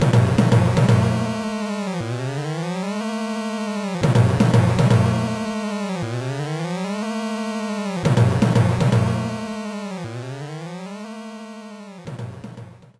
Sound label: Gate Opening; CIMA The Enemy; gba